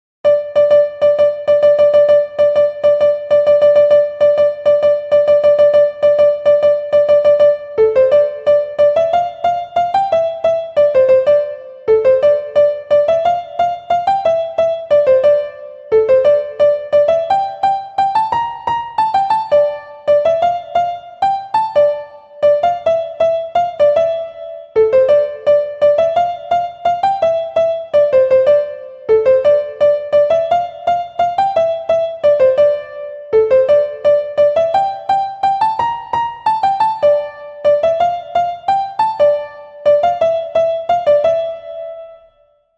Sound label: easy piano; pirates of the caribbean; jack sparrow; perfect piano